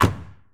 Viper GTS Door Close; Automobiles

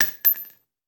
Bullet Shell; Sound Ideas; Guns

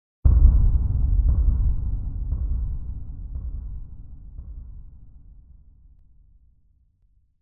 Impact Deep Drum Hit - Several, Rhythmic Fade Out; Hollywood Edge; sound designer's toolkit v2

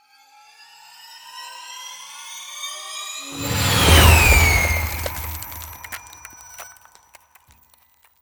Ice Spell Whoosh, Cold Whooshes; Arctic Aura

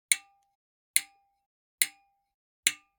Generic Click Glass Tiny

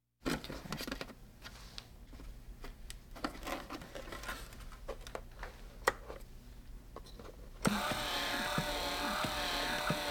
Hospital effects

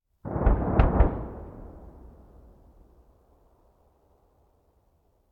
Artillery Cannon Artillery; Sound Ideas; Guns

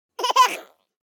BABIES LAUGH Boy 12 months Short; Baby Boom

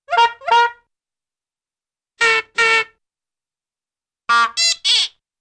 Funny, Short Nose Blows (bulb Horn), Cartoon; Hollywood Edge; Cartoon Trax

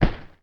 THUD Dark Short; mono; Universal Sound FX; THUDS & THUMPS

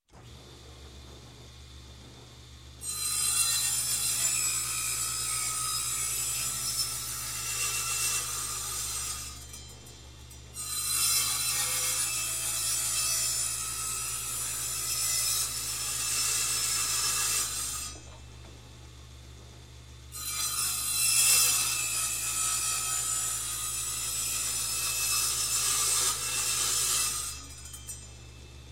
Construction, Saw Table Saw Start, Cut Wood; Sound Ideas 2000 Series

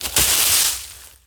Bodyfall Leaves; SFX Kit; Bodyfalls

